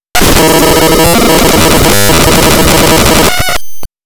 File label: glitch